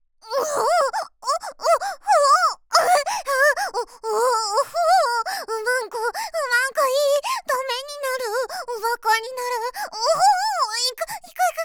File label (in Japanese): んおぉっ おっ おっ ほぉっ